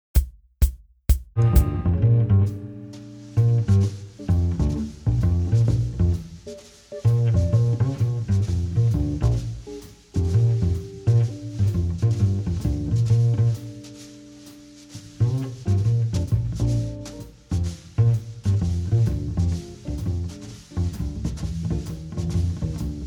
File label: bass i chord swing; transcribing solos